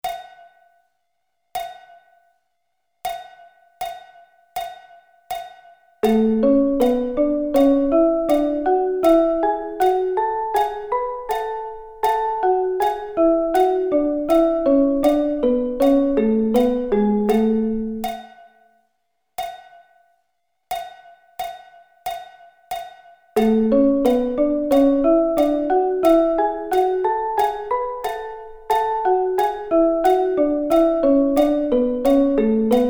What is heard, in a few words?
A Thirds